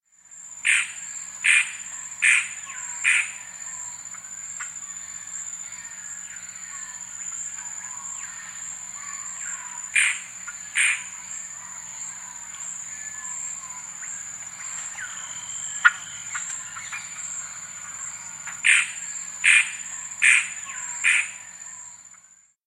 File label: Black-Bellied Gnateater; S. America